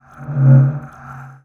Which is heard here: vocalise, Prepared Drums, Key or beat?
Prepared Drums